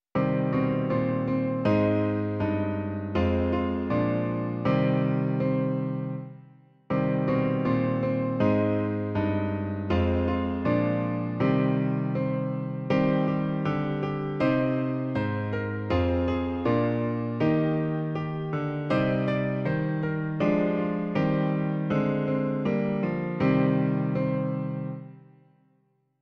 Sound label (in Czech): - klavír; Klavírní verze